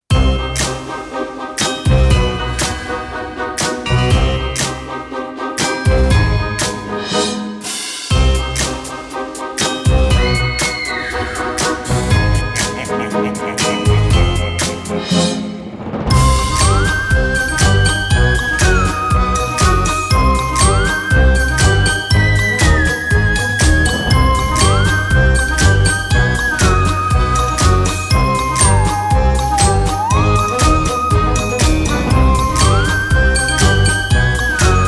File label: funny happy halloween party trailer teaser opener